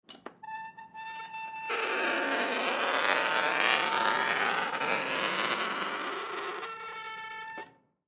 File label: Metal Gate Open On Marble Floor School Hall; Gates; Foley; Blastwave